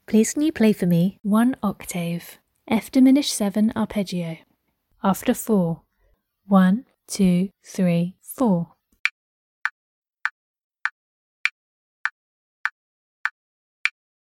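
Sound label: F diminished 7th arpeggio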